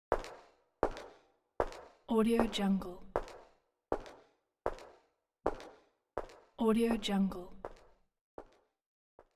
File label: persons footsteps walking by